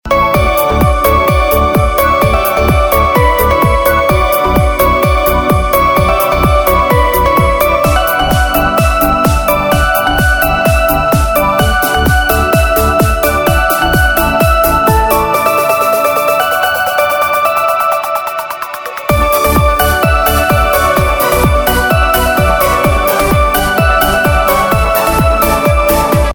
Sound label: Instrumental Ringtone; Bollywood Hits (2015)